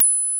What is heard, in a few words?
PER, TECHNO PERCS; Crypton - DrumBase5000